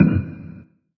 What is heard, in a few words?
click Coffin; netent; bloodsuckers